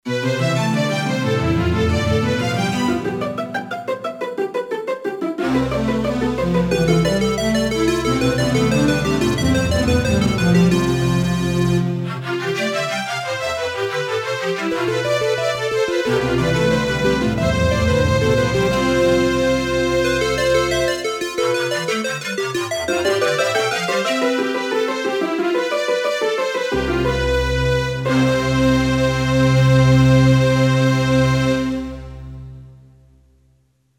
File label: Counterpoint